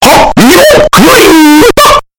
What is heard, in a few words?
tingle